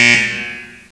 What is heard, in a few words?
Shot Clock Buzzer; Intel; Createshare; PC Camera Games; Shoop